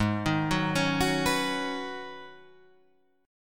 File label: Ab Minor 11th, Ab; Abm11 arpeggiated; JGuitar